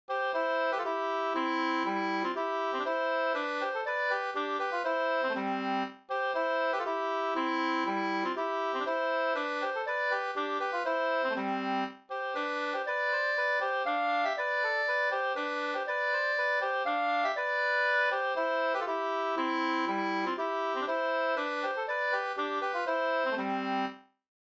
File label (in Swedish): polska; Vimmerbypolskan Sm Afzelius